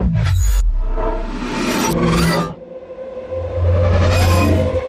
glitch; Inside Out

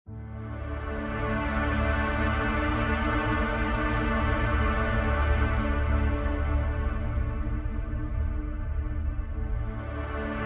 Pad Strings Only

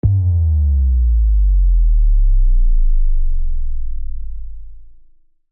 Faint Power Down

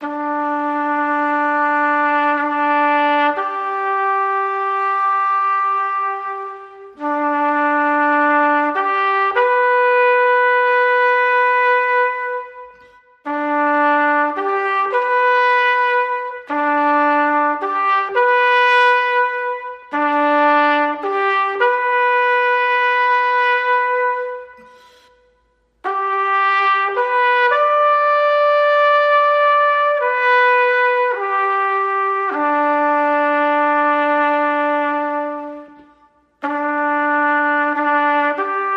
Taps; Trad (PD)

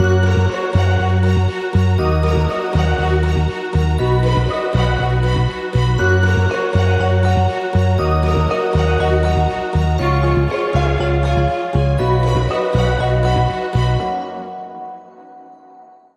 No Drums No Melody

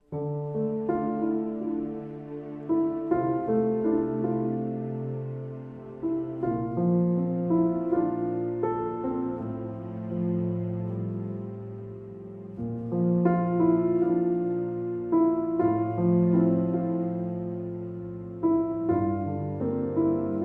With Strings

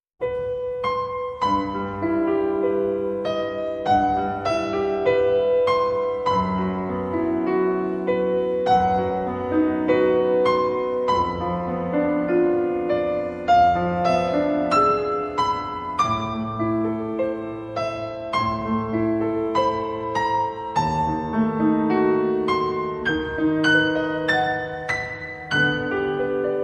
Only Piano

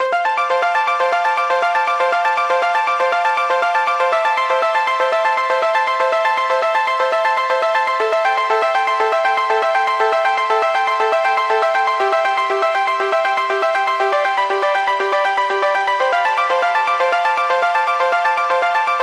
Serendipity Sunrise Arp Pulse

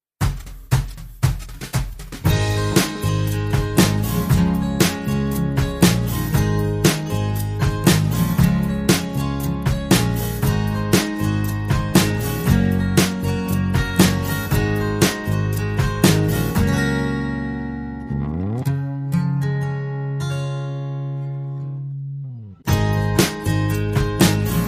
Drums No Melodica

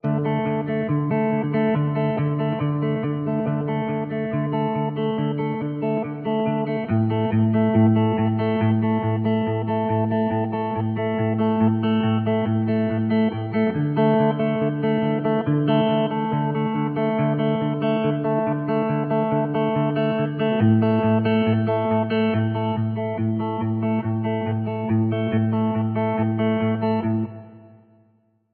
Wonderful Moonlight, No Drums

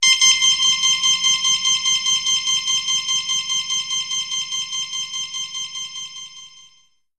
Reverberant Beep Repeater

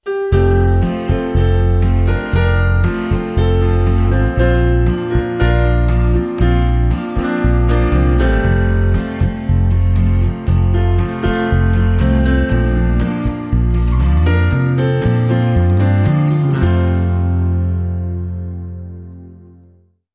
Easy, No Drums

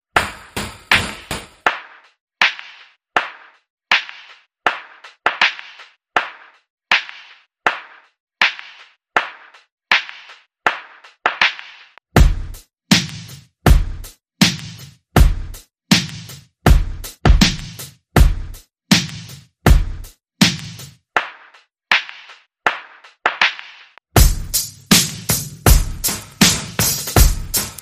Perc

